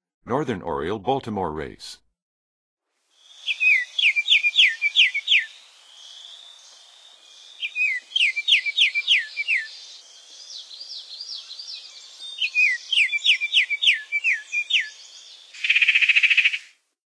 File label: Northern Oriole Baltimore, Songs of Birds of North America; Western USA; Western USA Songbirds